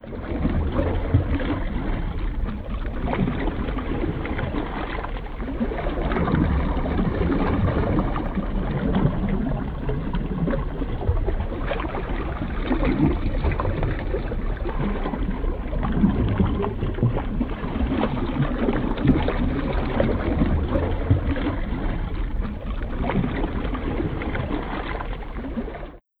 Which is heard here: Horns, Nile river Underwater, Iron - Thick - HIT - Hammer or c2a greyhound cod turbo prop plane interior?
Nile river Underwater